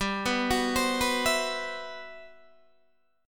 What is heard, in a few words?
G Major 13th, Guitar DADGAD